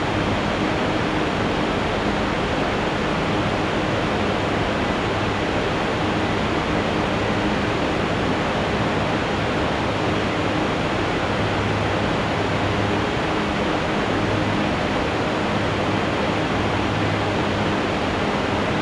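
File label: ambience fan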